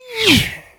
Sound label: WHIZ, cartoons